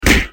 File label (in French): Coup de poing; Coups de poing